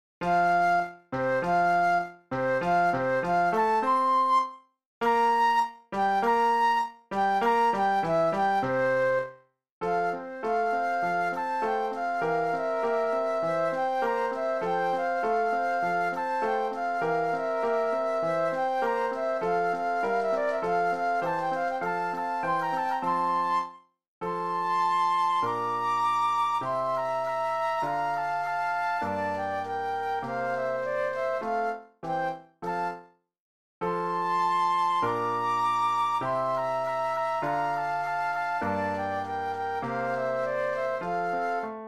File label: Flute Duet and Piano; classical